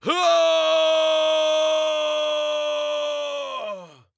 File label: Falling; Astro; Paladins